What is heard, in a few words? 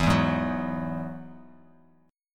E Major Add 9th E down Bass Standard; strummed; JGuitar